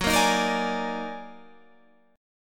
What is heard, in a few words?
D sharp Minor Major 11th F sharp